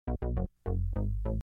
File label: Short Sms